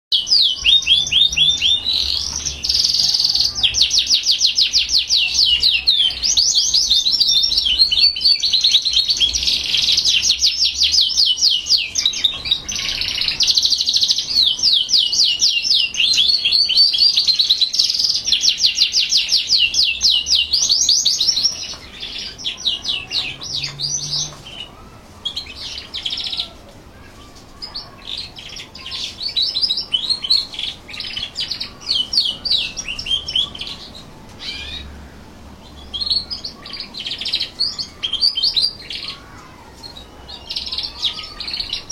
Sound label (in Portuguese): canto do canario ondulado do norte